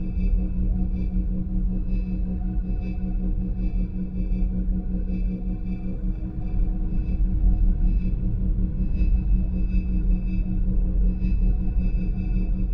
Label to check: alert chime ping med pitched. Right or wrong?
Wrong